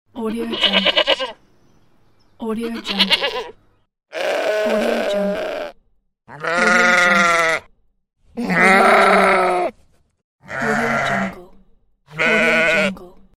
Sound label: sheep; spiceffects